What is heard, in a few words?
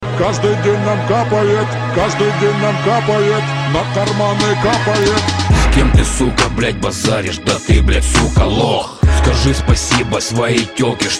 Ukraine Rap